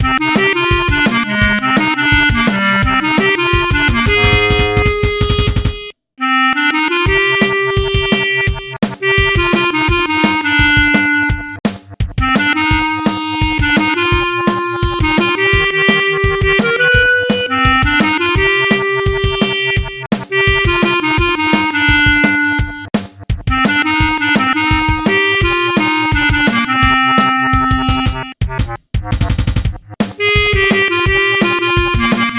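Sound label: Trumpet; Saint Seiya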